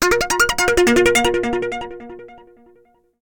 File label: notifications; Google; Android-Open-Source-Project; Star Struck